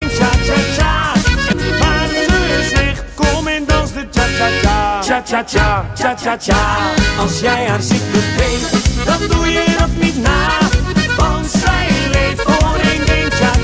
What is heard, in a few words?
cha cha cha